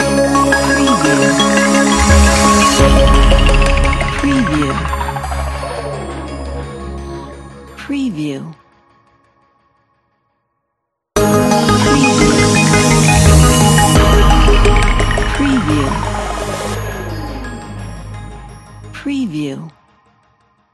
logo space

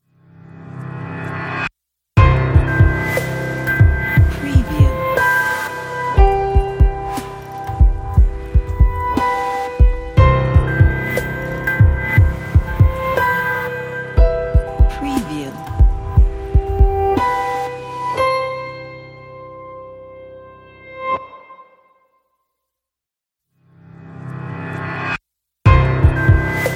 logo intro opener elegant

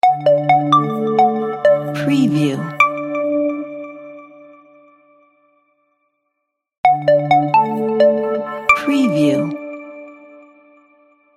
corporate plucked logo

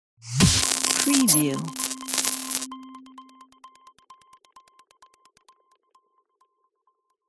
glitch logo

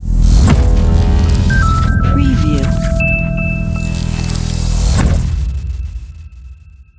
powerful moment

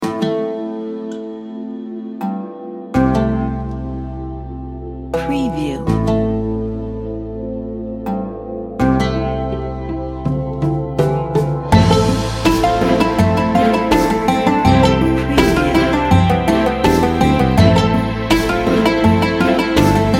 ethnic middle eastern intro

